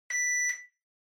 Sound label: Washer Front Load Beep Mid